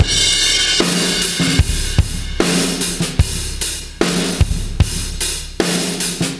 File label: Beat Loops; Zero-G; Monster Beats